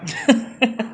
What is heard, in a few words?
haha